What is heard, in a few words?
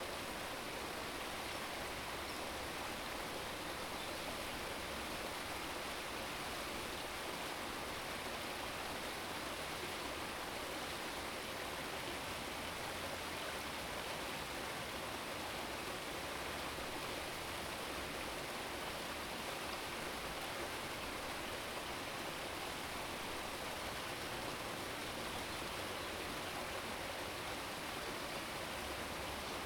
park ambience river